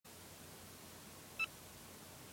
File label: beep